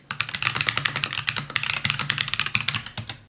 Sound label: clack